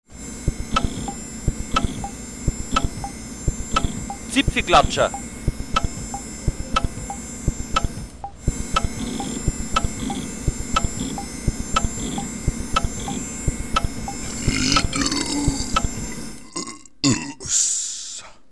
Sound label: hitachi ibm sound